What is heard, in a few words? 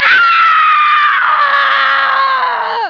death; mk2